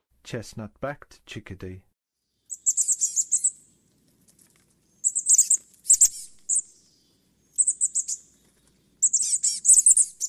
Chestnut-Backed Chickadee, Songs of Birds of North America; Western USA; Western USA Songbirds